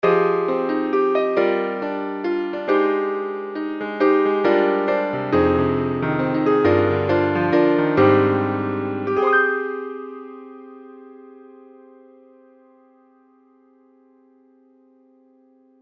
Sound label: C7 Piano; Alchemy